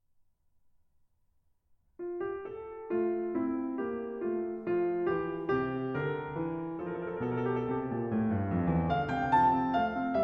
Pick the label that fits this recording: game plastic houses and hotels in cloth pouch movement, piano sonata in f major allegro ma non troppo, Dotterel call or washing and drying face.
piano sonata in f major allegro ma non troppo